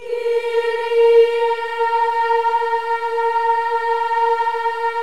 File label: KYRIE, Symphony of Voices